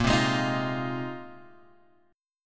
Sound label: Bb 7th Suspended 2nd Suspended 4th, Guitar, Open F; strummed; 10,7,8,10,8,x; Bb7sus2sus4